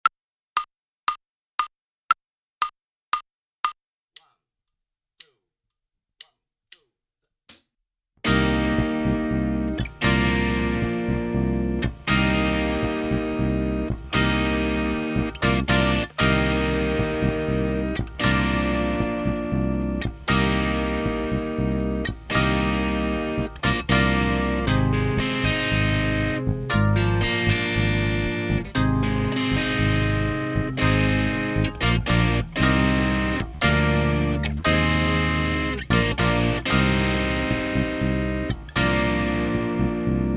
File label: Minus Drums; Inst Ensemble